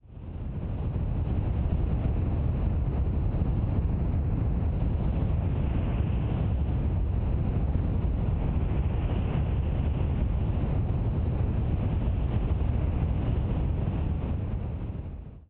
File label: turbulent storm